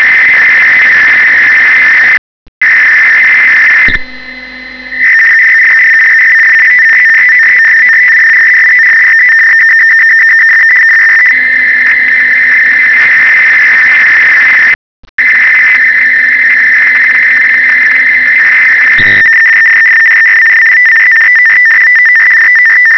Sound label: mode gmdss, Radio